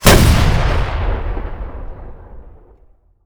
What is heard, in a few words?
fire, rocket; gmod; arccw uc commons attachments